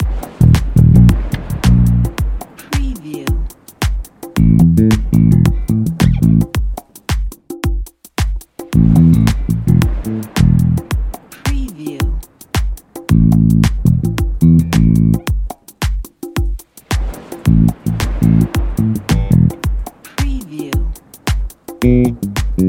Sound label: bass 6-8 bastaki